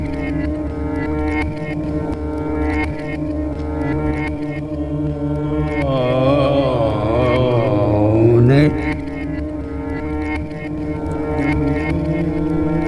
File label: Classical, Vocals Male; Sonic Foundry (Sony Creative Software); World Pop